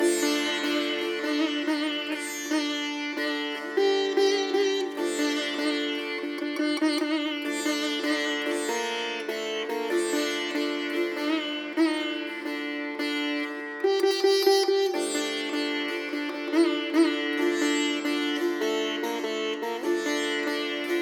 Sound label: Sitar theme; raji an ancient epic